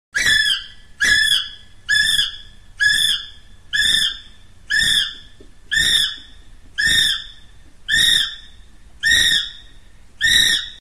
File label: Kiwi; پرنده کیوی